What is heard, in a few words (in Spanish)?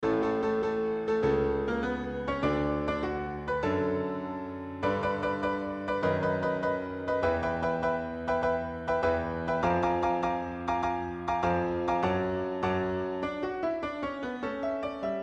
Version Midi Coro y piano; Himno de Unibac